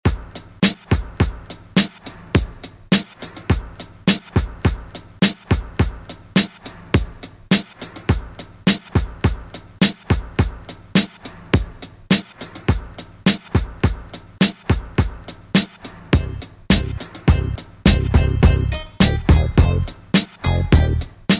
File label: 90s Intro Outro 70 Bpm; DJDOLLS